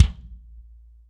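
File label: BD DRY, bass drum; Real Mega Drums; Best Service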